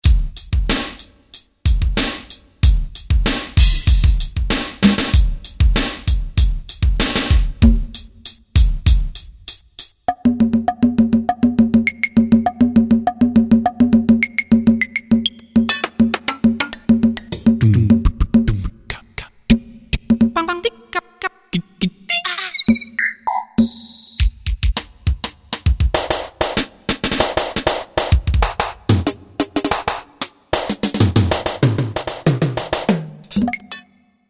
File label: Drum Kit, Korg Wavestation A-D